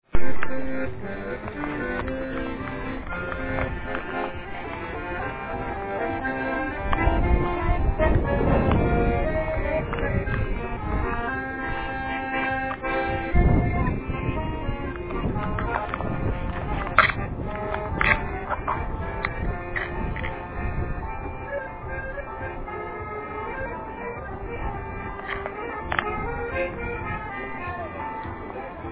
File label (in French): accordeon; Naantali